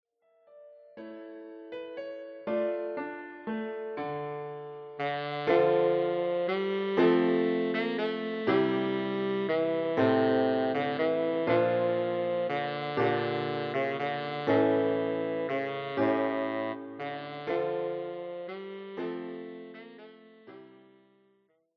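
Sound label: Greensleeves, Tenor Saxophone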